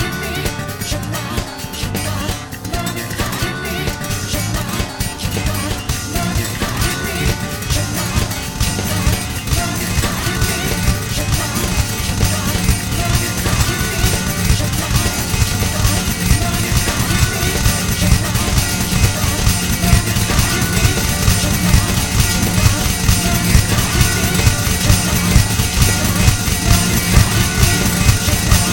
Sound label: RINGTONE